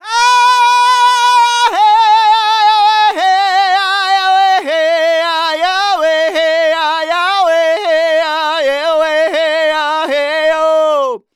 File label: Native Vocals, Native American